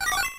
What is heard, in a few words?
High score; RedDot